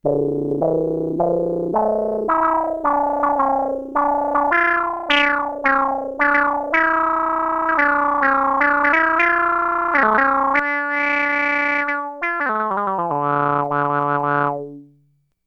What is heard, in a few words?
Growl Wow, Roland SH-2000